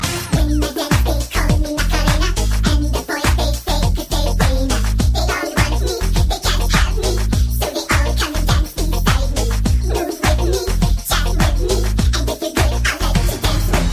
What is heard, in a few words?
Funny